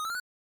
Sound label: Game Started